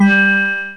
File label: STEEL DRUMS